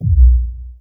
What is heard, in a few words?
KICK, Hip Hop